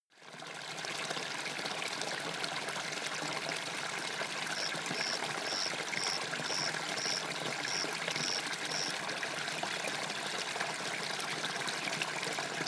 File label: river ripple close birds